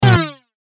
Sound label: button; Buttons (Sound Effects:Multimedia)